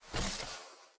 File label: arrow fetch, bow; bow deerhunter